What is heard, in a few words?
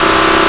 glitch lead